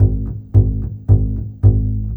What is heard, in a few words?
Bass Pizzicato, Orchestral, Rock Pop; Sonic Foundry (Sony Creative Software); Orchestral Series VOL-4; Rock and Pop; Rock-Pop 13